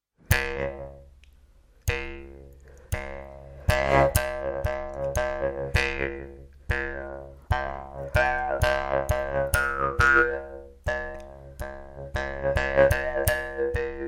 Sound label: bgm